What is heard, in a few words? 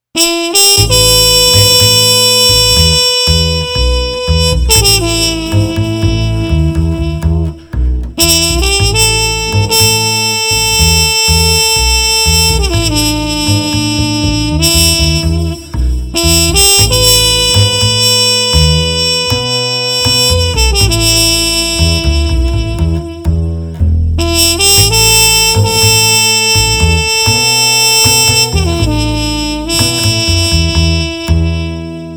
Street Trumpet Combo Smooth no Drums 120